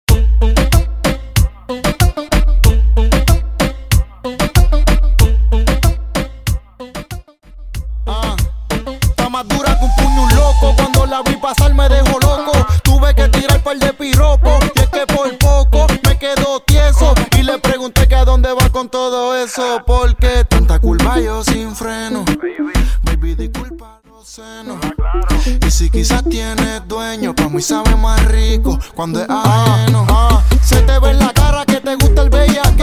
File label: Reggaeton Percussion Intro Outro 94BPM